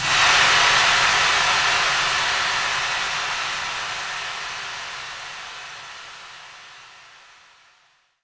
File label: Crowd Effects